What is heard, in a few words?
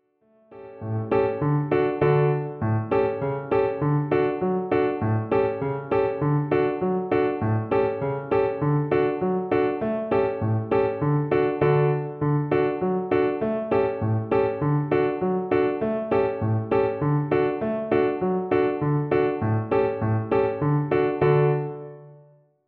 scale reel